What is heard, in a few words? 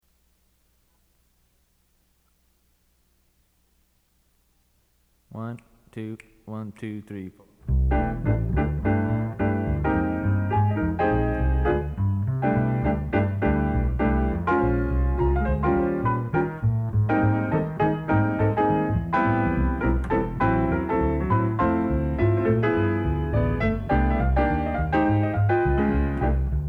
drums; Rhythm Changes (B flat), three choruses. Play time with brushes for two choruses.; jazz auditions